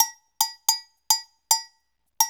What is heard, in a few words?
Soukous